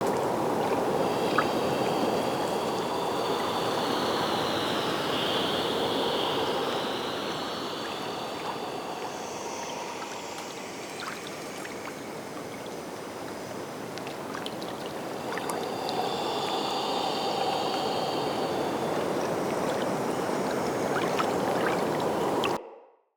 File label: Ancestor Tree Bed Loop, Ambience; Soul Plane